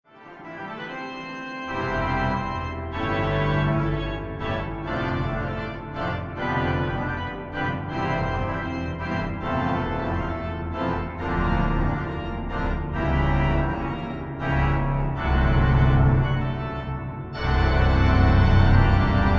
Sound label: Organ Fugue; Baker House; Altiverb